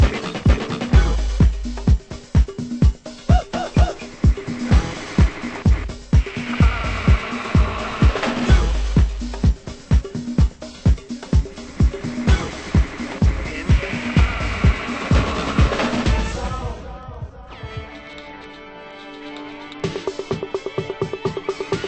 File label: beat